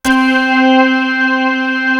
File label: R ORGAN; East West; Global Sounds; ORGANS